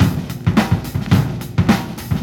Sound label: little drums